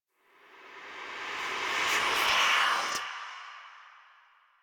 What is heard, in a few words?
Cave Whispers